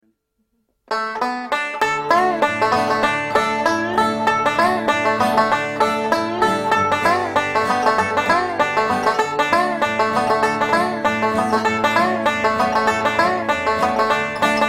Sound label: banjo riff